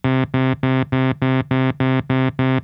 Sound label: Nord Lead 4 arpeggiator